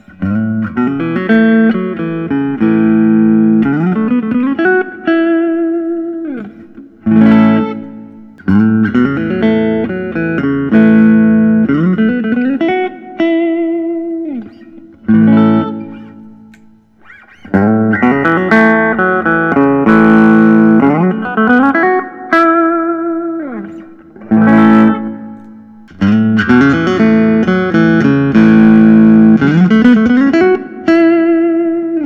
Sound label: parker fly mojo; four seasons summer edition 2008